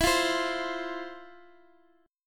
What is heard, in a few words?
F 5th E, chord; F5/E strummed